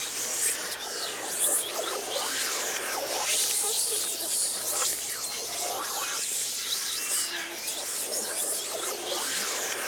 WHISP REV, whispers

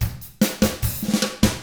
Double Platinum Drums; 50S ROCK

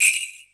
Jinglebell, Percussion; MARKYDRUMS

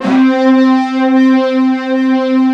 big disto; East West - Global Sounds

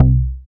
BAS MKS-50, Roland

